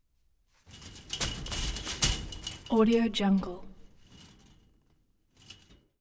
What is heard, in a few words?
chainlink fence impacts